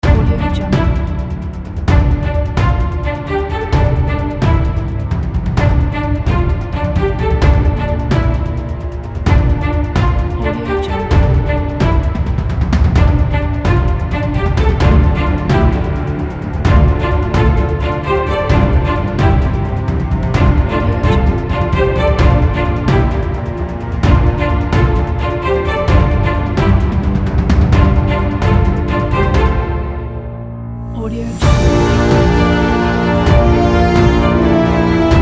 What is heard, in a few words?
drama trailer